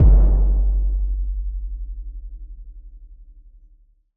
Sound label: drummer gun big boom taiko kic